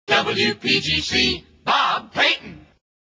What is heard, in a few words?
jingles; pams